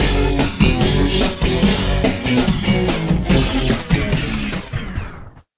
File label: Modern Lose; Tanktics 1999 PC